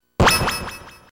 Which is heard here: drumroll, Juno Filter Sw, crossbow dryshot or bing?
bing